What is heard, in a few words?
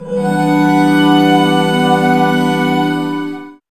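SYN CHD, synth chord; X-Static Goldmine 1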